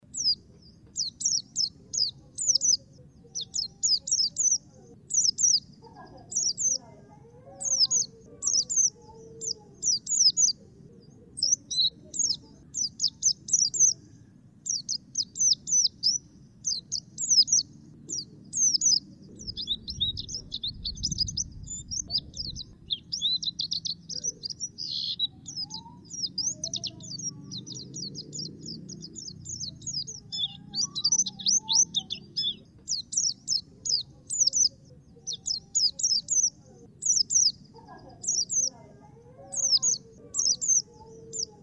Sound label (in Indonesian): Suara Burung Pleci Gacor Ngerol Panjang